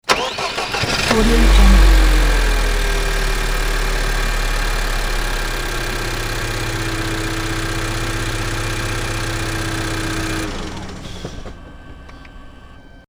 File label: car engine start